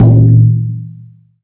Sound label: bounce; Birthday Party Bash; Wii